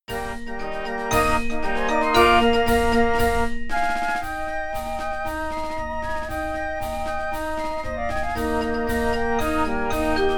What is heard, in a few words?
flutes and fanfares